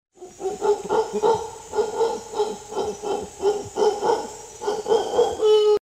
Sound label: red howler monkey